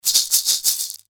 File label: shaker